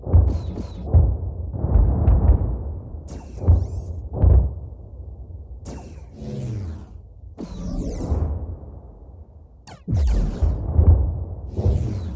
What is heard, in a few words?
Ambience Battle; Angry Birds Star Wars 2